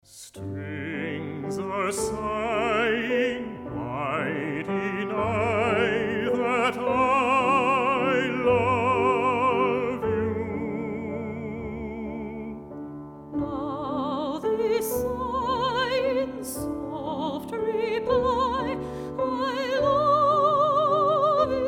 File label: widow waltz